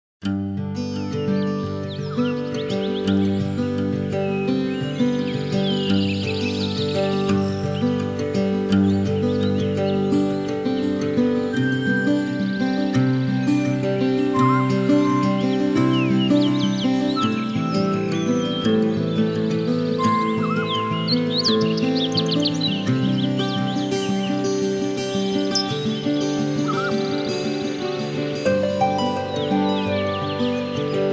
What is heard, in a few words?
alarm Cuckoo, alarms; TCL L10